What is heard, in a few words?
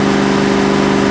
Mustang67 rpm, Engine; Cars; XBOX